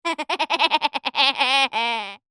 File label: silly Laugh